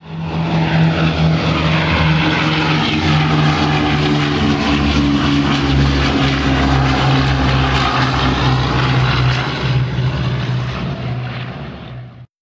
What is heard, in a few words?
Boeing B29; tomsplanes; simutrans; Pak128.Britain-Ex